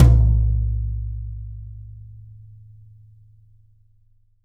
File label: YAM18 BRUS, brush drums; Big Fish Audio; Ross Garfield Drums VOL-2; T-YAM BRUSH